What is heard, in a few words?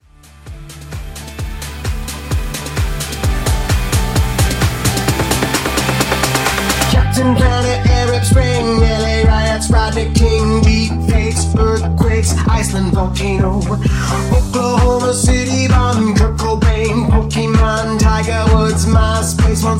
Redrum